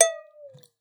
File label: water; Xsample VOL-9